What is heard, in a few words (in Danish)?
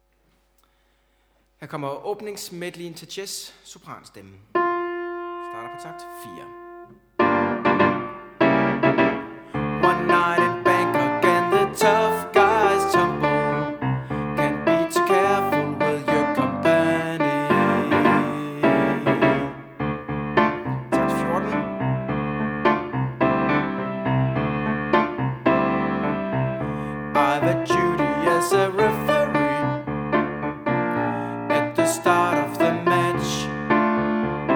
Sopran